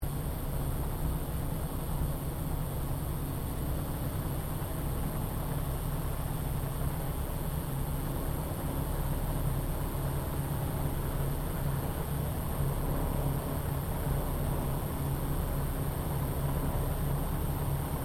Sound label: AIRCRAFT LOCKHEED HUDSON WARMUP INTERIOR, Hudson twin engine light bomber; 1941; Airplanes (Sound Effects:Vehicles)